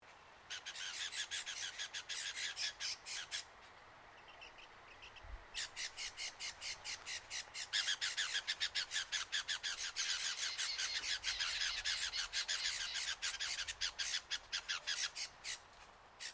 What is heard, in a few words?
BIRD LEWINS HONEY EATER DISTRESSED CALL, Birds in Queensland; Animals